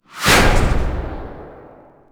bazooka fire; WAR

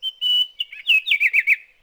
oriole

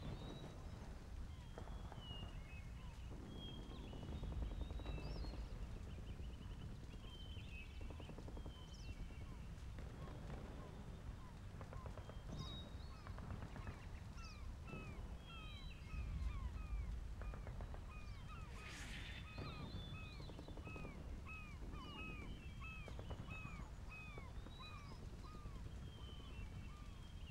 beach; WAR

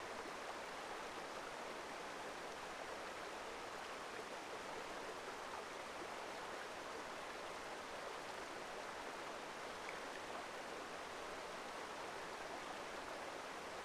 river; WAR

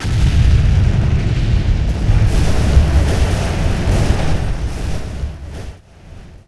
rumble

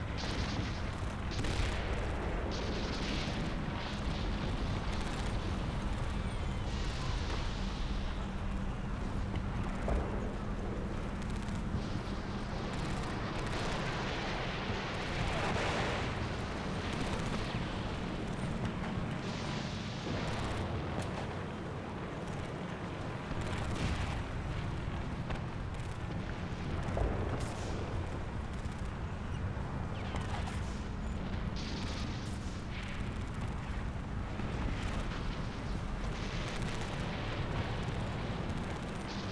in battle; WAR

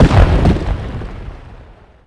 medium impact generic; WAR

